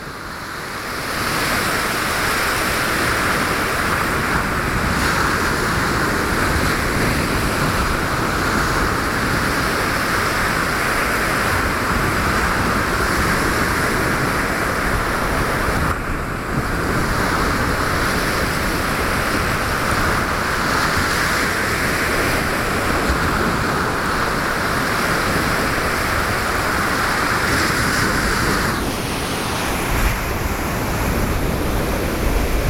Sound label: Binaural Ocean Left Hand Side